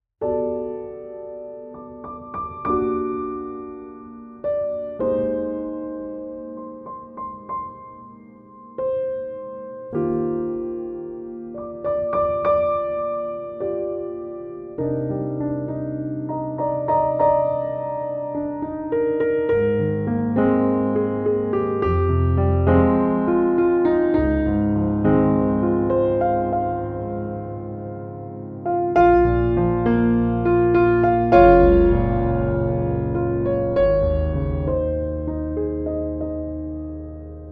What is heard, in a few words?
balladic